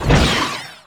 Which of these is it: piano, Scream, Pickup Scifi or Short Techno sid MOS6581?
Pickup Scifi